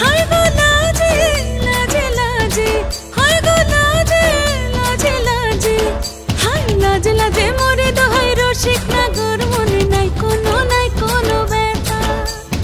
BENGALI FEMALE RINGTONE

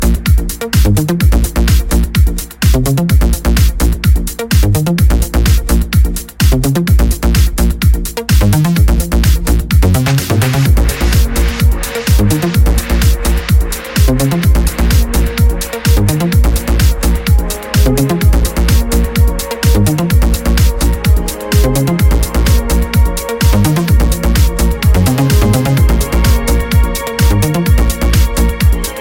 raw techno ringtone

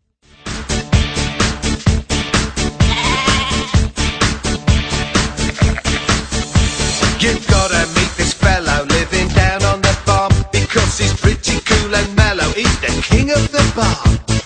MUSIC RINGTONE; SHAUN THE SHEEP

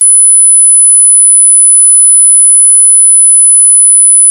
10K HZ LOUDER

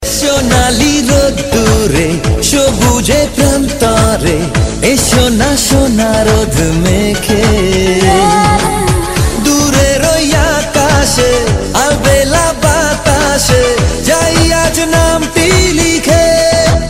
song ringtone

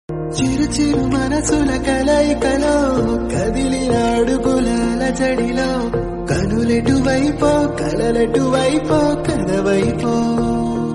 TELUGU RINGTONE

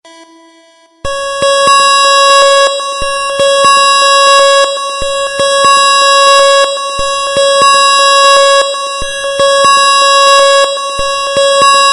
ALARM TONE

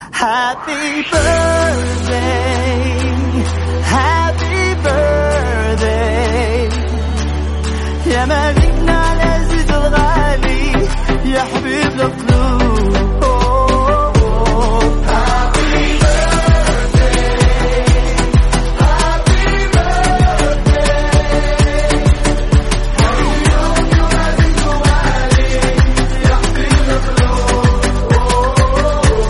happy birthday song ringtone

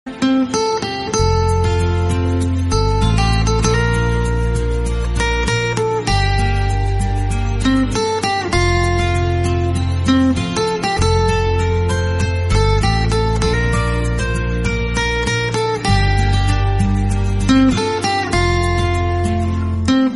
INSTRUMENTAL RINGTONE

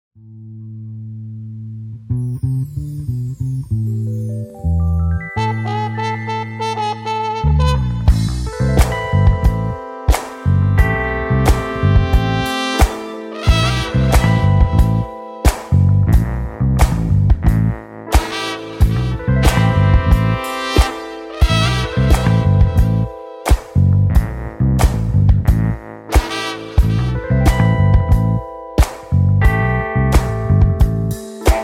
THEME SONG COVER RINGTONE; GTA V

